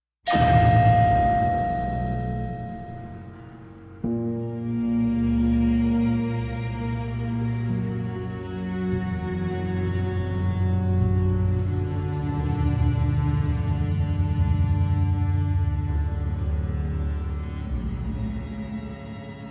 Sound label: scary music instrumental; آهنگ های بی کلام ترسناک